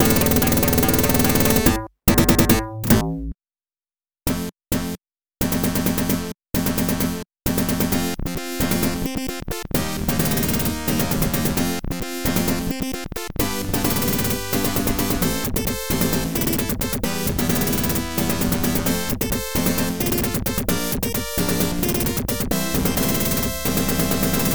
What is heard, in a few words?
arcade game over; ikari warriors